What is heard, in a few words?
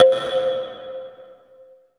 KALIMBA; East West - Phat & Phunky